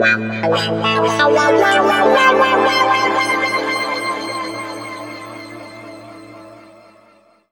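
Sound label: A pitch shift wah, Guitar Samples; East West; Steve Stevens Guitar Samples Collection; PTCH-SHFT+WA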